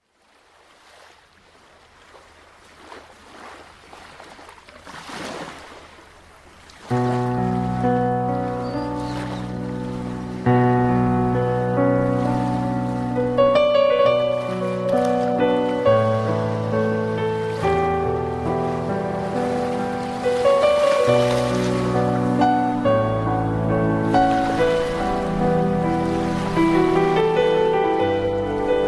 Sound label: Calming Sea